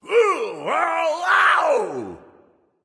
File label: nv fear, vox; smite; Vulcan